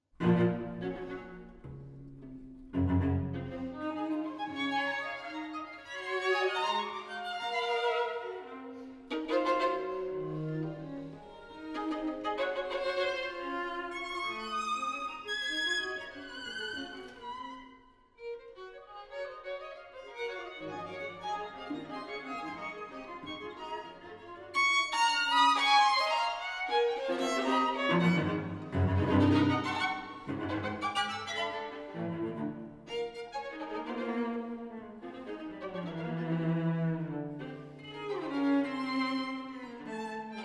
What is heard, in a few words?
String Quartet; Edward Cowie; 'Birdsong Bagatelles'; Partridge